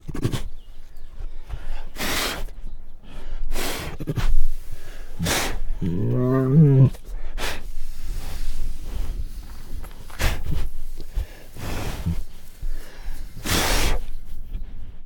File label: Female Bengal Tiger Hard and Loud Snorts; Tigers; Sound Ideas